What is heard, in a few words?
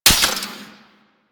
Spark Rifle Mech; Grineer